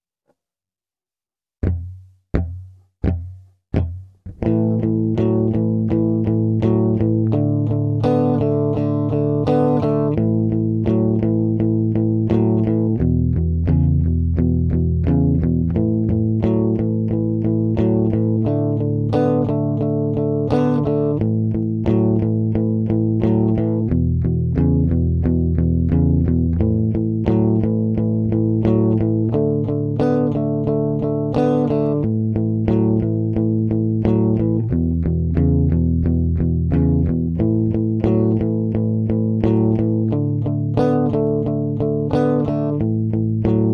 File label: 4 Bar Blues in C.; Each letter is equal to four beats; pentatonic fun